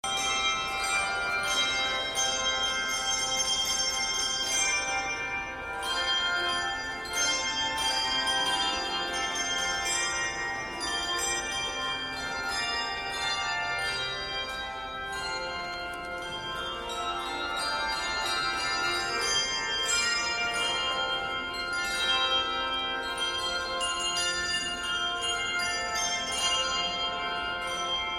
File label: Prelude, Carillons